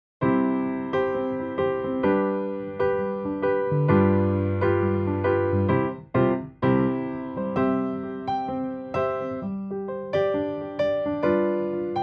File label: Backing; iniciantes